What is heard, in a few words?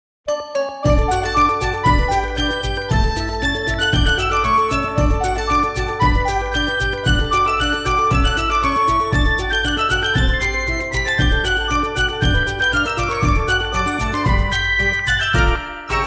Melody Roll; Ever Oasis